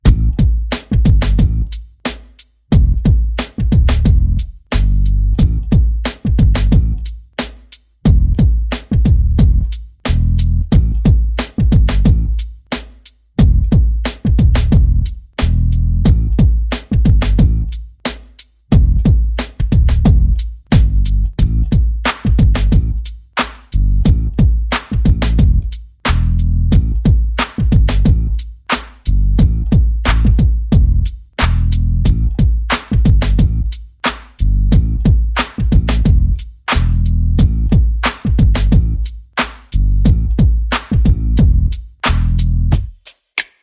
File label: Bass and Perc